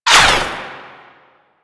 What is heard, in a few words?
Ranged Weapon Whizzby; Warframe; Necramech